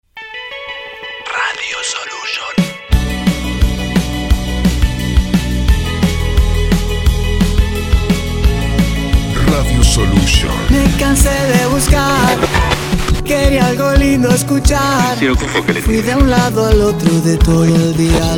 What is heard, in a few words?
JINGLE INVIERNO